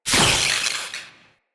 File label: Galvanic Zapper Arc